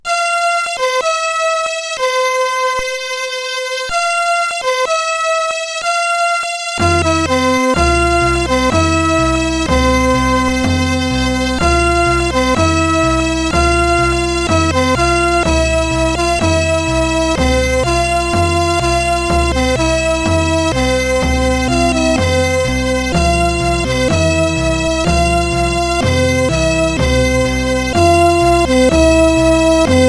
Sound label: folk, DIGI Booster